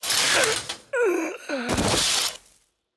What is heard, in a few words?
gambling win; WildGuns